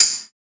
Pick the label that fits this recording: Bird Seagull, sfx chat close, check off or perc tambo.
perc tambo